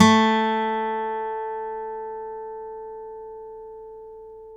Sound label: STEEL F A2, GUITARS; Synclavier Sampler Library